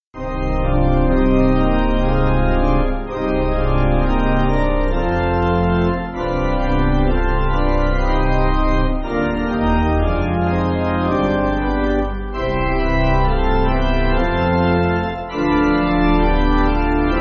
Pipe

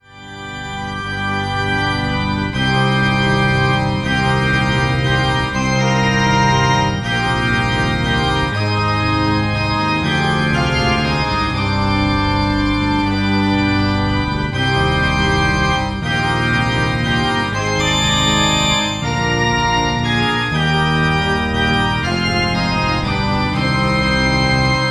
Organ

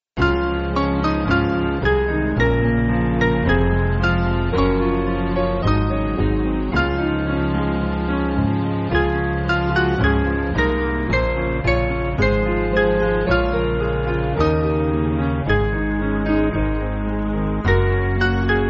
Piano

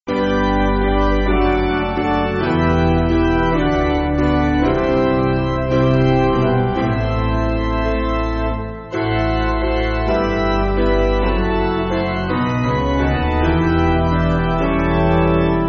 We Will Sing Piano

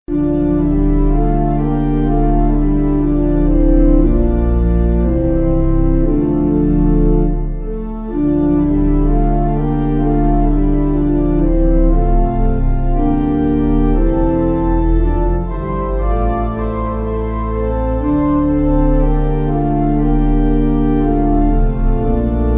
Pipe

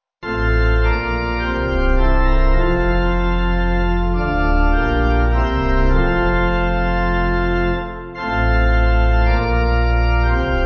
Pipe

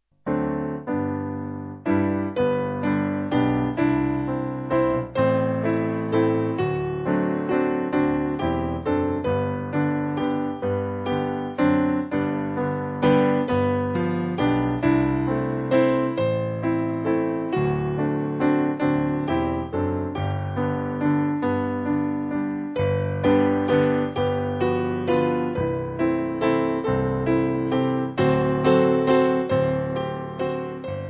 Piano

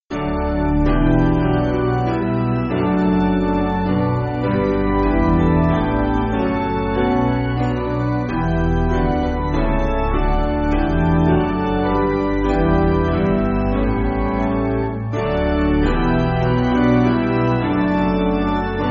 What Gift Can We Anniversary Song Piano

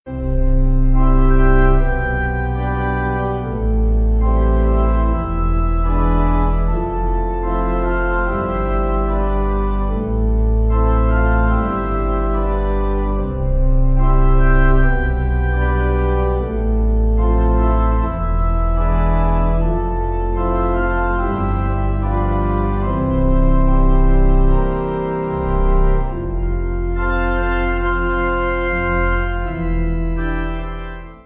Pipe

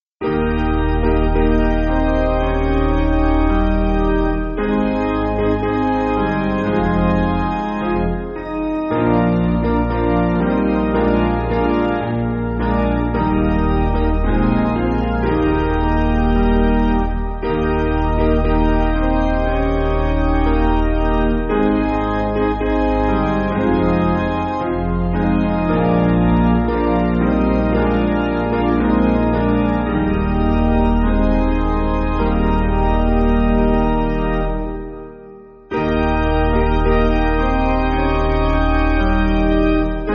Piano

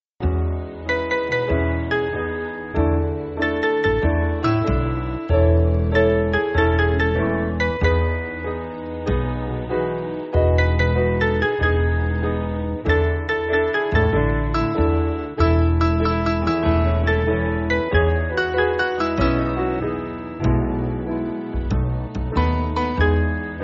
Piano

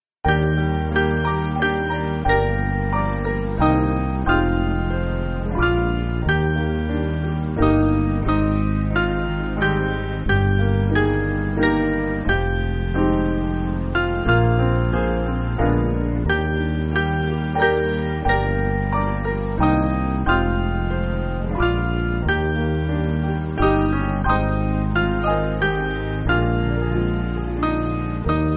Piano

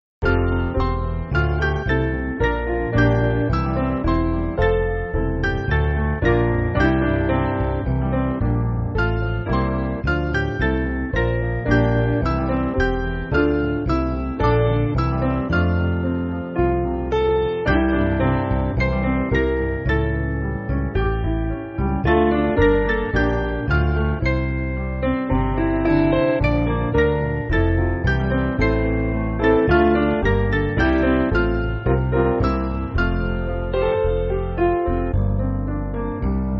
Piano

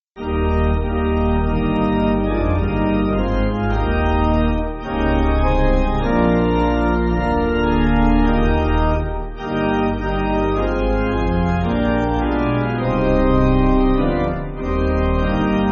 Pipe

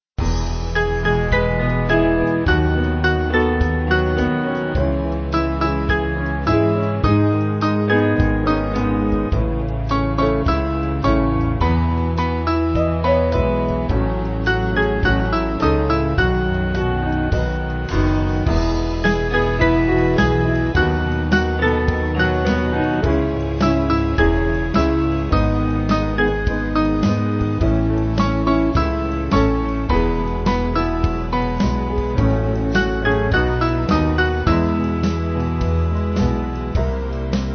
Band

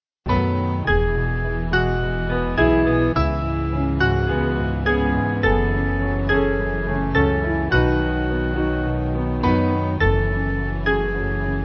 Piano

